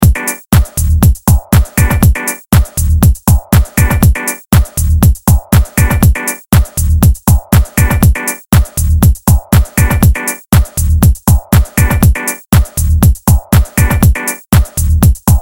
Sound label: Bass Synth